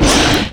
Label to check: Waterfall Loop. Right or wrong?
Wrong